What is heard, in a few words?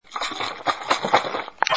Gargle